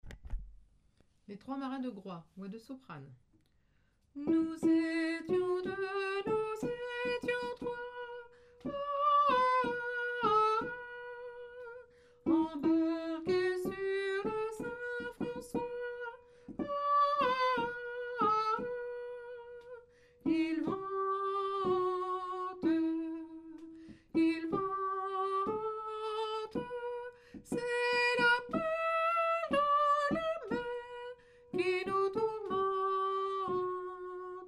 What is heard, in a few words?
soprano; les 3 marins